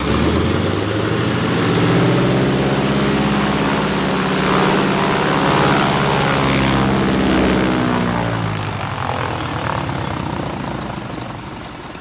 Prop Plane DHC, de Havilland Canada, Rev, Hold And Off